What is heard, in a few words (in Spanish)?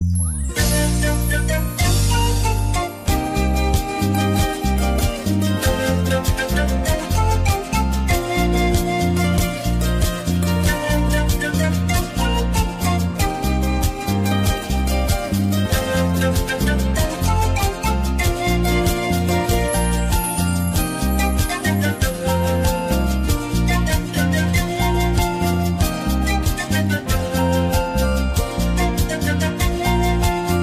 FONDO MUSICAL